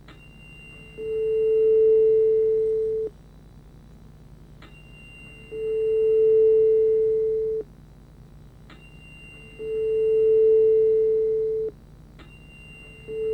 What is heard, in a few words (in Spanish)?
diapason